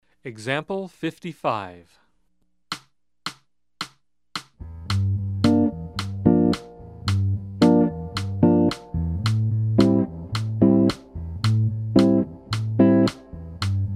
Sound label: Jazz